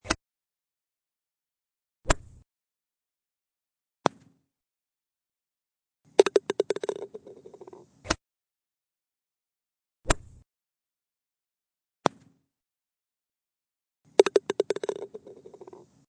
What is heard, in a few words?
Golf; Golf Round 1 Ringtone